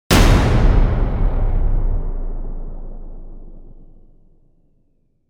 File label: Testament Hit Epic; Ninja Tracks